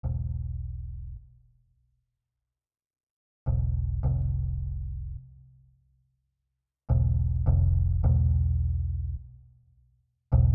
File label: remix elec guitar